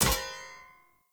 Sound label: Short Sword Medium Impact Metal; Player; Environment